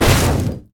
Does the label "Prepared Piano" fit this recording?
No